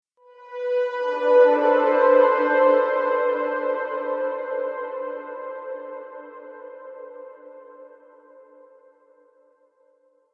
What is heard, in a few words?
Cathedral Fanfare; Musical